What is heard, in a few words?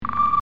Beep; Star Trek; LCARS Audio